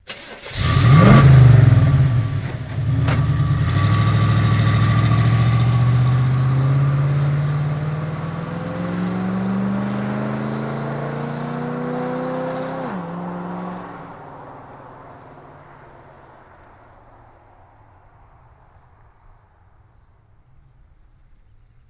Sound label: Blazer Strt Awy, chevy blazer